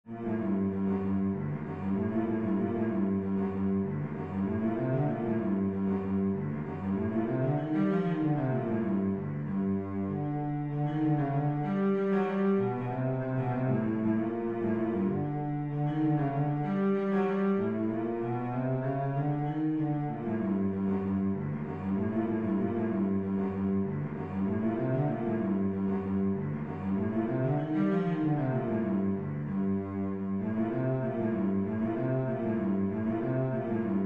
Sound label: nahawand cello